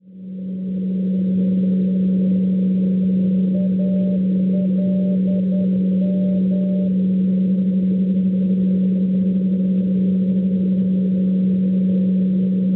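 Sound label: non-directional beacon 413 kHz; ALM; Aix Les Milles; Francia; Schwarzsee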